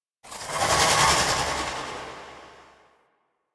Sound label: Distant Vocal; Nira